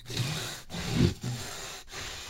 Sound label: bone saw, Surgery; Shitmed; Medical